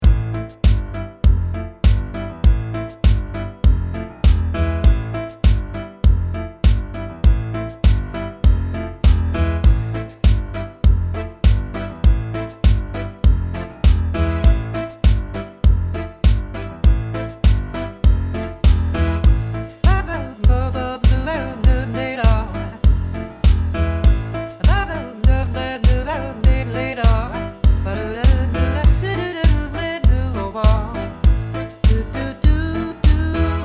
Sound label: Smooth Nu Jazz